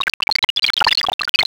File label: pour beer